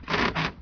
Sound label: wood creak, materials; Garry's Mod; hl2